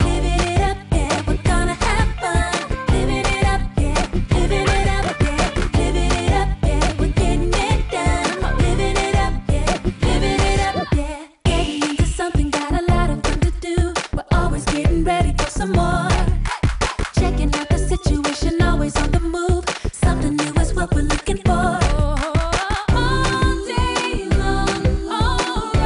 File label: Menu music; Bratz Super Babyz